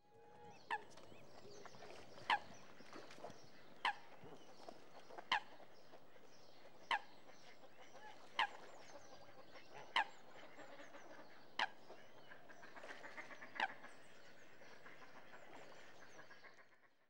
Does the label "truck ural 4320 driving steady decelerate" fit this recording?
No